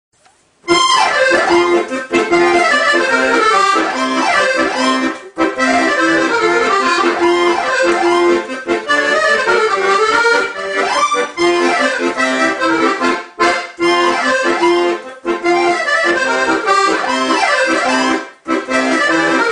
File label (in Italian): Fisarmonica Impazzita